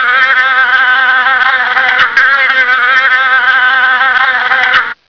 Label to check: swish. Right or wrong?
Wrong